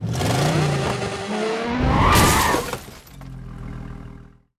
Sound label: Slide Spin